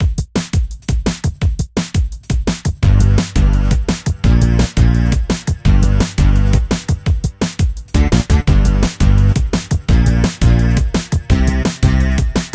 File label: Menu Music loop; Nickelodeon Super Brawl Universe; Android